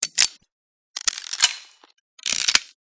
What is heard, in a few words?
aek906 load, weapon